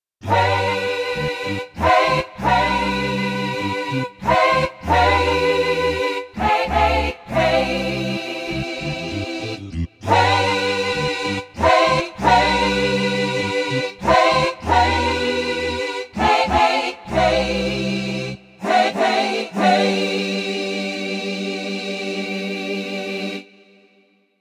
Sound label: Gospel